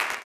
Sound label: CLAP, Blues Soul; Spectrasonics Vocal Planet